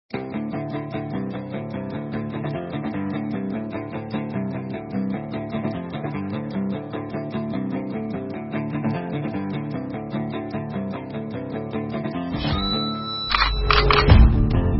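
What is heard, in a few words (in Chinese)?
挑战场景背景音乐（快节奏）; xingqiu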